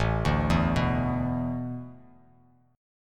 A Suspended 2nd Suspended 4th, Bass Standard, Asus2sus4 chord; arpeggiated